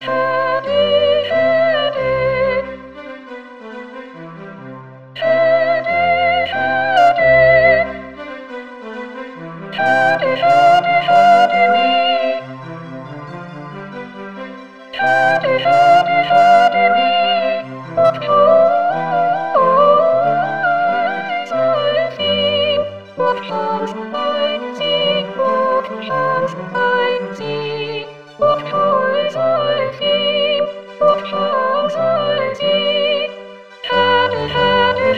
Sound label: Happy we Sop